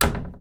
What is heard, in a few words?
wooden closet close